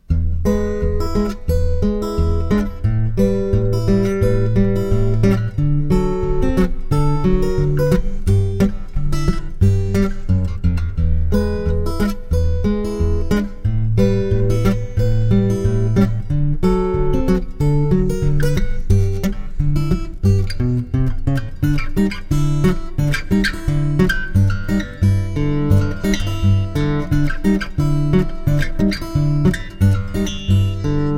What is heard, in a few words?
travis style tunes